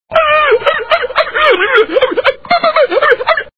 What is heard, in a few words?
bark talk